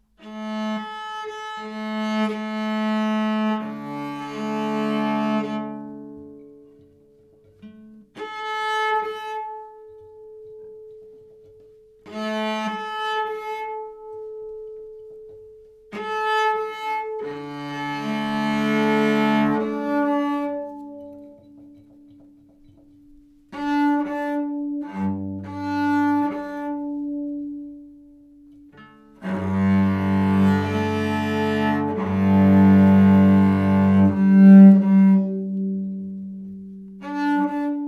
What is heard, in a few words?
Cello Tuning Close